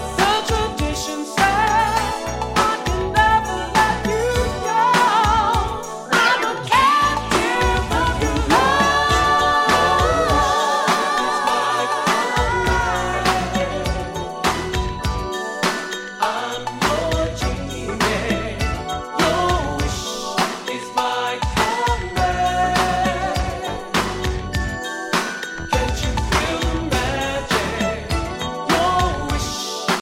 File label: 12 Inch Dance 80s Groove